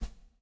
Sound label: Photo General Focus-Up; viewfinder